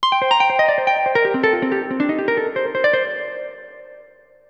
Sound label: ELECGUIT, AMBNT, 160, C; Ambient; Guitar